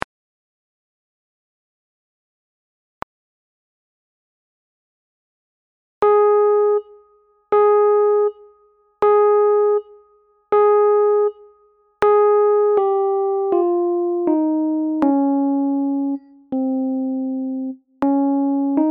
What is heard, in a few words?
aflat scale study, 20 bpm; A Flat Scale Study, Page 22 of Artistry of Fundamentals; Selkirk Senior Concert Band